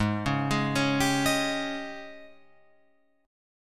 Ab Major 7th Suspended 4th Ab Guitar Open F; AbM7sus4; arpeggiated

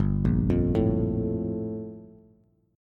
G Minor 9th, Bass; Gm9 arpeggiated; 3,1,3,2; JGuitar

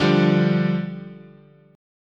D Minor Double Flat 5th, chord

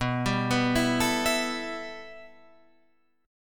B 7th Suspended 4th Sharp 5th, Guitar, Drop D

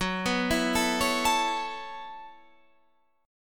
B 7th Suspended 2nd Suspended 4th, chord

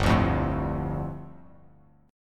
G 7th Suspended 2nd; strummed; JGuitar

